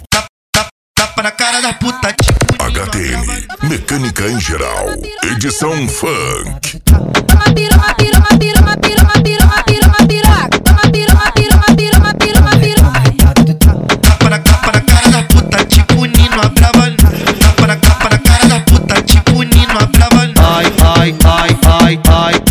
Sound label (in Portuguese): mecanica em geral edicao funk